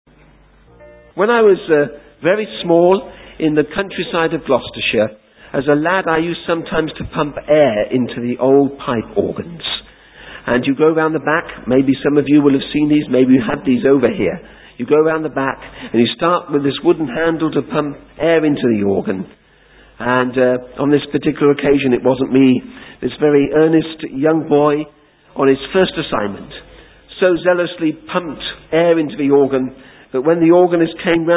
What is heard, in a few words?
An Old Pipe Organ